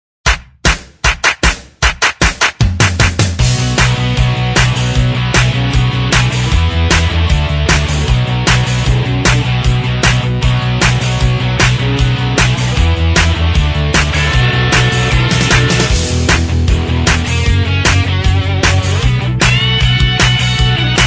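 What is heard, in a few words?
instrumental, backing tracks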